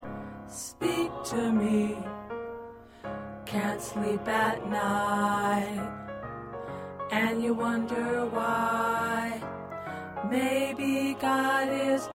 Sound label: Tenor, choir parts